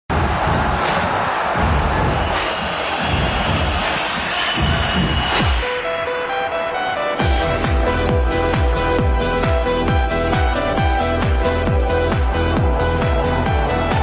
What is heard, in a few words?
Sports news, تیتراژ آغازین اخبار ورزشی